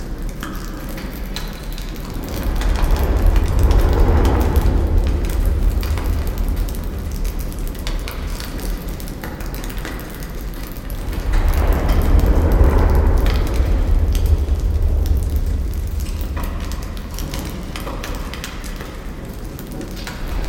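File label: amb apocalypse loop, ambient city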